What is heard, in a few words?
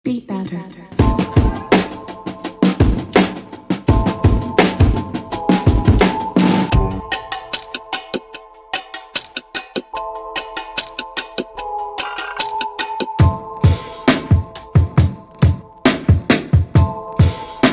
drums from the basement; Beat Batter